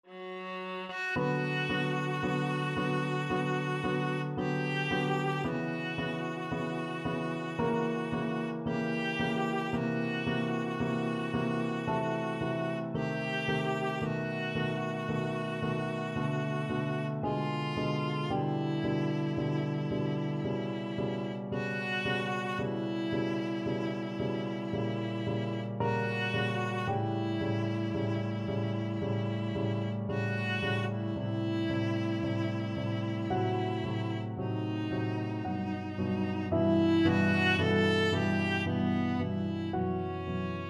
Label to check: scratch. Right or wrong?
Wrong